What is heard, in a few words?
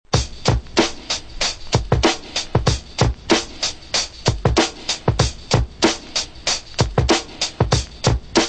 drum loops